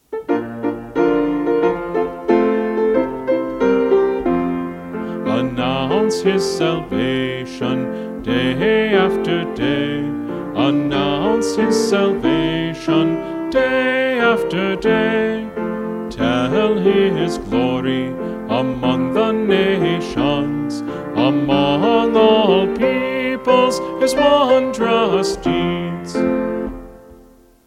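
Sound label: Jingle Bells Psalm All Parts, Choir Practice Tracks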